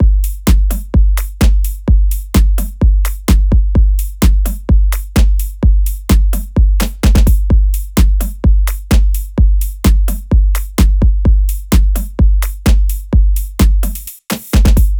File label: loop full 128; Seismic Loops